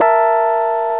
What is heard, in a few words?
piano keys; instruments various